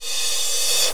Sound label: Reverse Cymbal; Roland BK-5